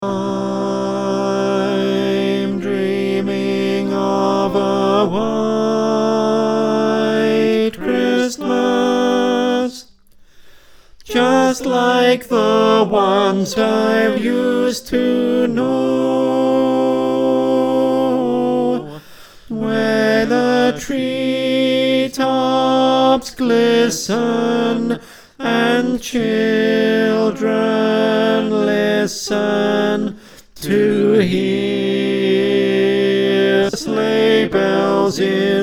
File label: tenor, choir